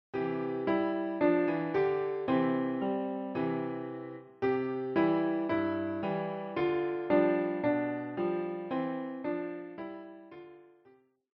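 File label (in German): Klavier; Hänschen klein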